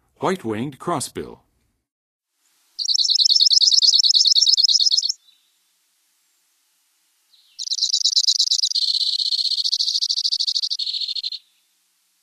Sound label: White-winged Crossbill; North America; Western USA; Western USA Songbirds